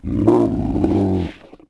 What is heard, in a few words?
Herb growl, Dino